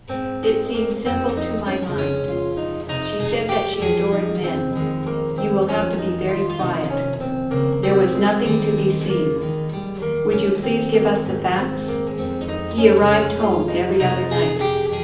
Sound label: gitar